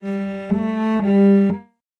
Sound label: Cello Phrases G Minor (120 bpm); Instruments; Distressed Strings; Ableton; Mood Reel